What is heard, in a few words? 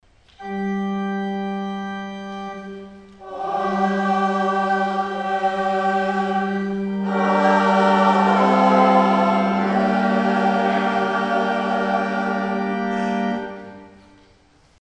Amen